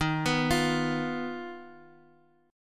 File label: D sharp Suspended 2nd Sharp 5th, Guitar, Open D; 13,14,15,x,x,x; chordshape